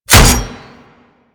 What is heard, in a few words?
Barrage Hit Metal; Warframe; Warframes